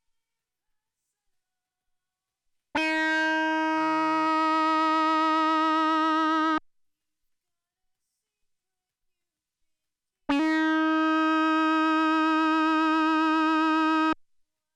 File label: moog